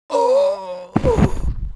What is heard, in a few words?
Mob die; 청룡투영